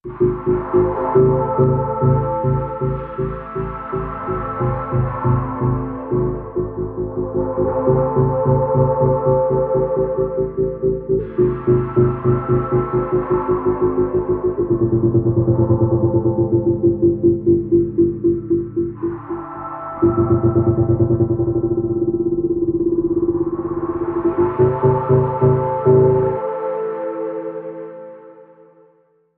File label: Pulsing Aura